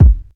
short kick drum sample a sharp key, Royality free kick drum sound tuned to the A# note